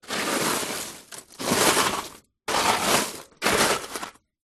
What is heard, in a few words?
foley charcoal pieces in metal fire pit movements